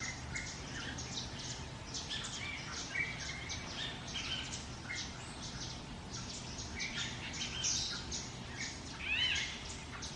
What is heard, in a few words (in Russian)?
krasivoe spokojnoe priyatnoe sverchanie sverchkov na fone otdalyonnih zvukov ptic